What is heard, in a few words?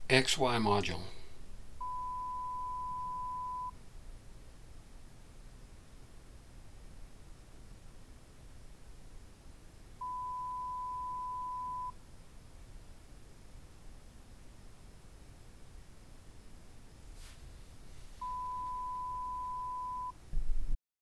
noise XY Zoom